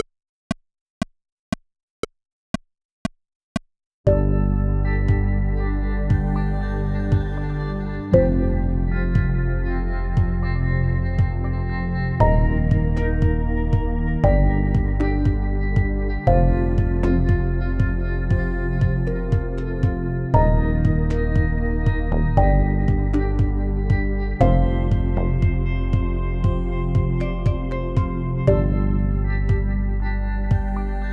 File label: bongo track; Mystery Solved